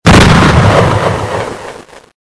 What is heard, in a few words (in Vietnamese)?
Tieng min no